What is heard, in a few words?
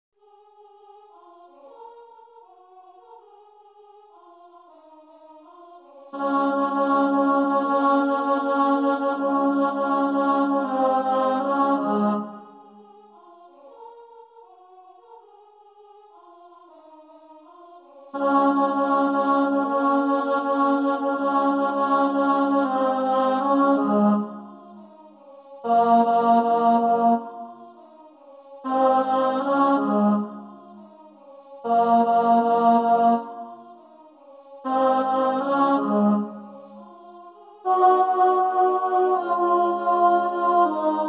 Bonse Aba, alto, choir